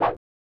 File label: Swipe